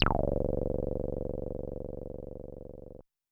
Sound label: Square Max D0